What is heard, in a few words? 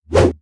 sword; Weapon